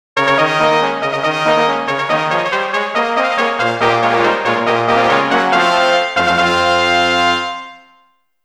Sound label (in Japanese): すごくヤル気のファンファーレ; Monster Farm 1 2